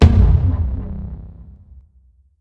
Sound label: taiko low; smite; raijin